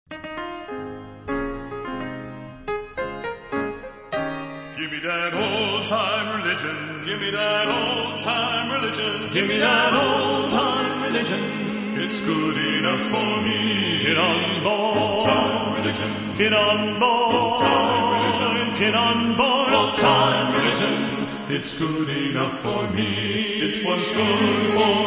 Men's Quartet

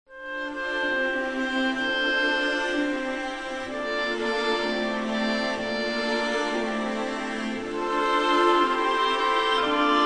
Christmas Lullaby, strings

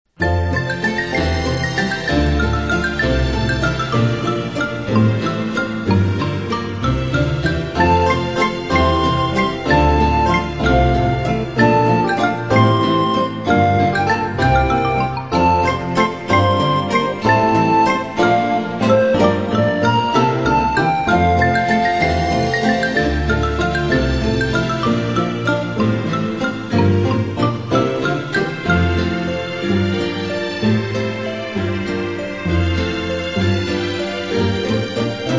Majesty Strings

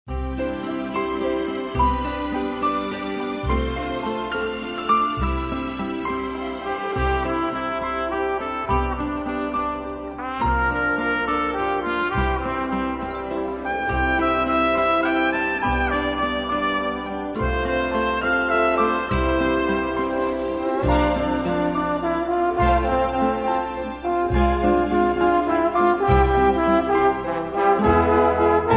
Victorious Strings; Majesty Orchestra

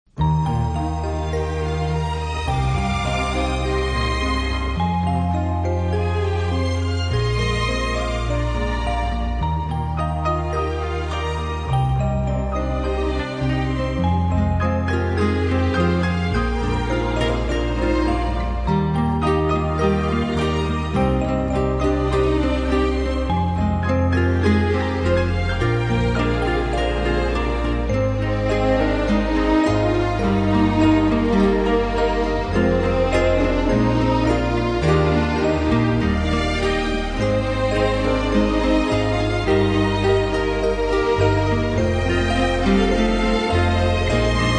Orchestra, Strings

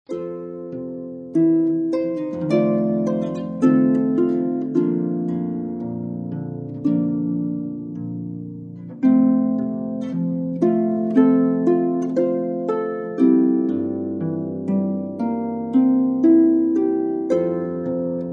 Harp Hymns

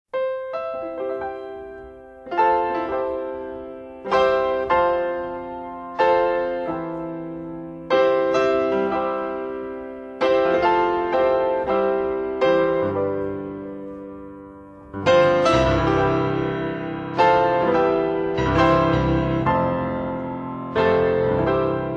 there is a fountain piano solos

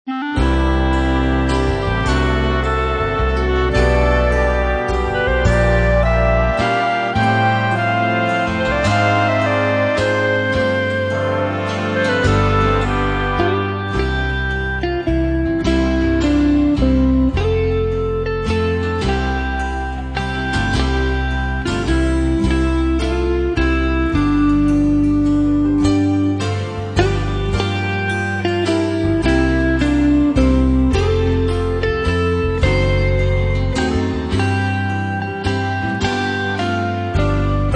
Instrumental Hymns

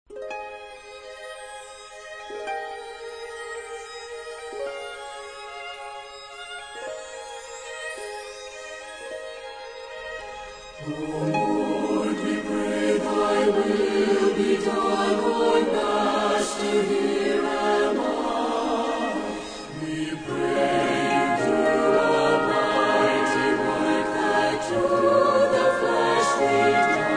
Choir And Orchestra

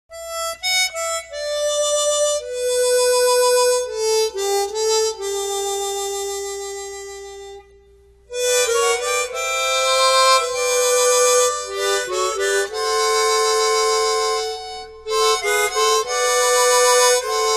Favorite Hymns On Chromatic Harmonica; Have Thine Own Way Lord

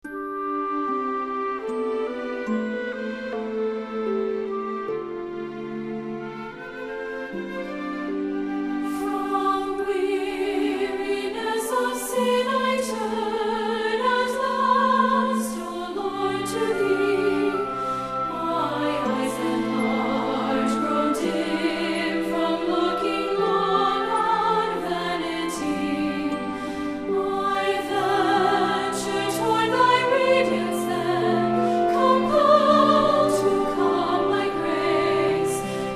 Singers And Orchestra

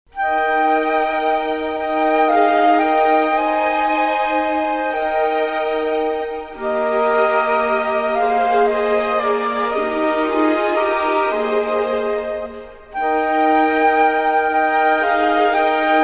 Orchestra

